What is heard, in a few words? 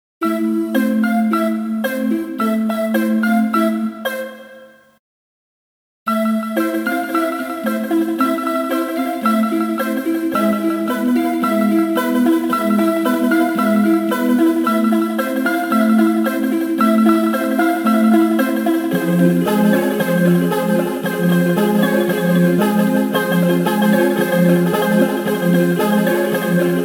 Alesis Midiverb 2 2 Tap Ambient